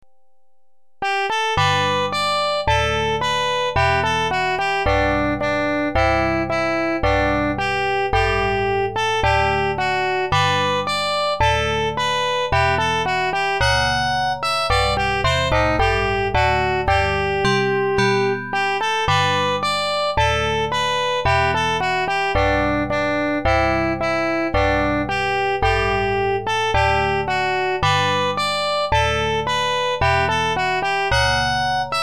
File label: bgm